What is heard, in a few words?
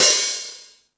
High Hat, Drum Machines E-MU Pro-Cussion